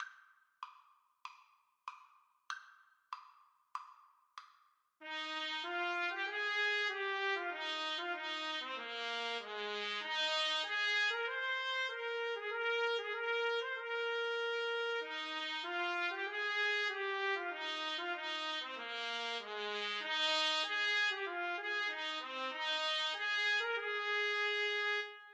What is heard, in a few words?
trumpet duet